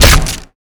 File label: grapple; killmove; Garrys Mod